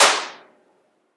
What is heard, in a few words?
bullet impact rock